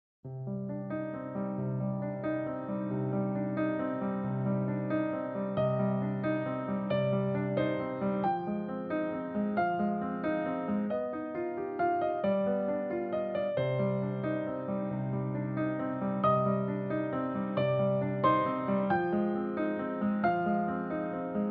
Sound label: Aggressive Piano Theme